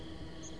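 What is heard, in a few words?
Chestnut-sided Warbler, nocturnal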